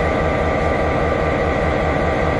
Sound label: KDs1 idle; finnish